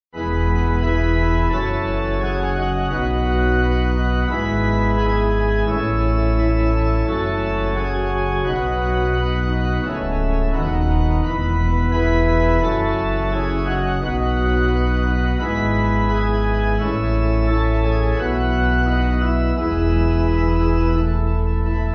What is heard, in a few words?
Pipe